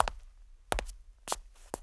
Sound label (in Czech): doslap muz; Mafie 1